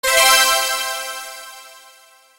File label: chime